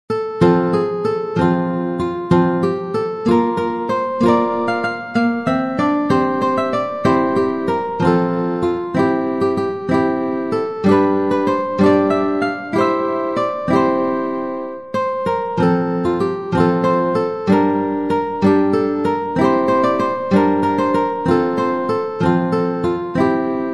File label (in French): guitare solo